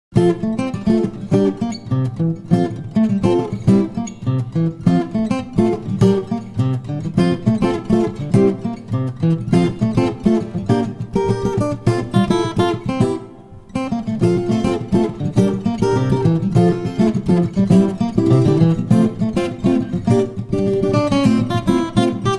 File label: acoustic guitar, music on hold